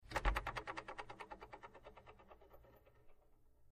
Antenna; Oscillating Antenna Flicked Back And Forth.